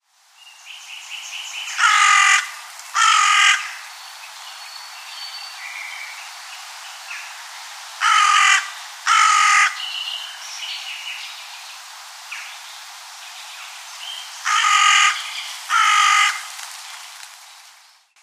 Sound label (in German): rabenkrähe